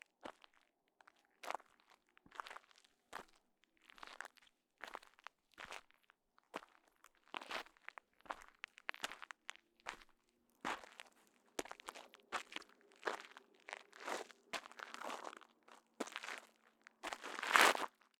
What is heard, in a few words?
Dirt Footsteps